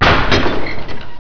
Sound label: Door Open